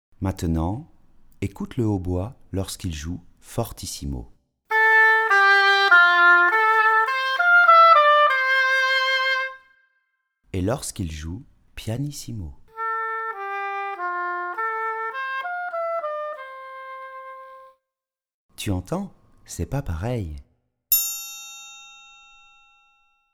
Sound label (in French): Oboé fortissimo vs pianissimo